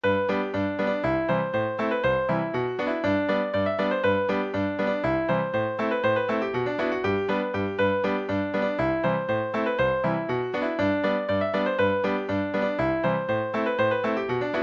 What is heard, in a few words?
polka, session tune midis; church street